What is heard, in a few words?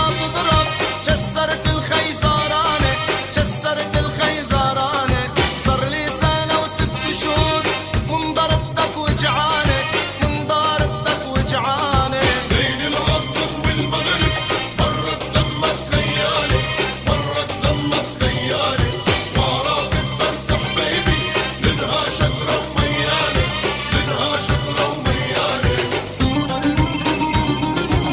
arabic ringtone